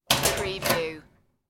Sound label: lock metal locker, the sound pack tree